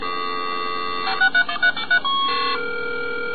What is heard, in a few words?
computers 56k modem